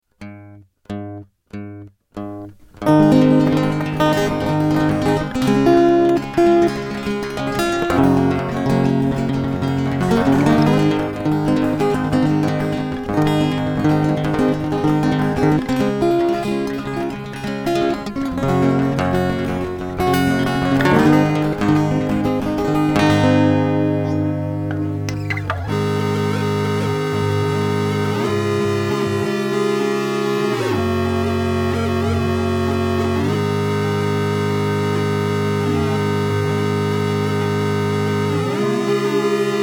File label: Floaty Chords